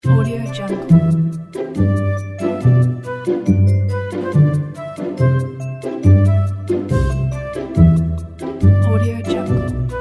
cheerful kids mood

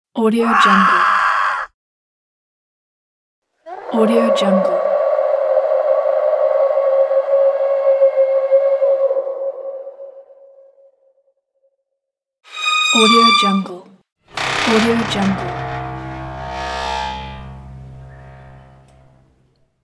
halloween pack

